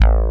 FM-7II B

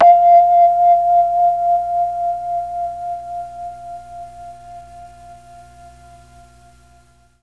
F3 VIBES, Mellotron MKII; Mike Pinder - Mellotron Archive CD

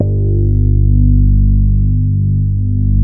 MOOG BASS G2; Best Service Dream Experience

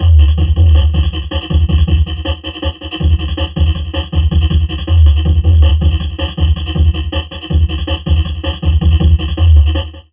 Drum Loops Crazy Processed

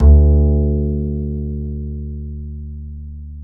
D 3, Bass; Spectrasonics; Bass Legends

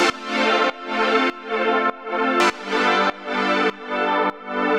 Pad 100 A, sidechained samples 100bpm; alesis 1:4

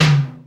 XE8 T8; Hollywood Edge; The Big Bad Beatmachine

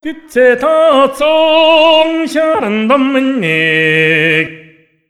MONGOL V, Asian Traditions

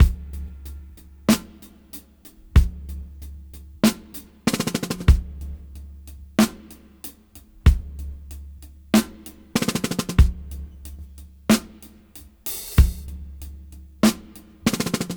Half Time Slow 94 BPM

